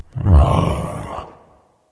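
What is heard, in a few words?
thinking, nonverbal; smite; voicepack; khepri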